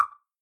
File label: match not ready; Night05